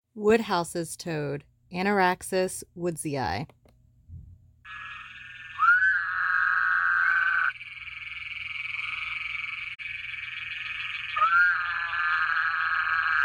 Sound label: woodhouses toad